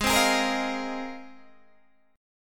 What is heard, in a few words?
Ab 6th Add 9th Ab, Guitar, DADGAD